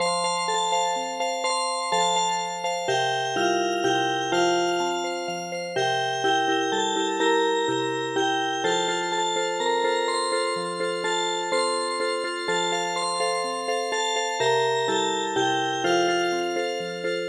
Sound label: cuckoo music box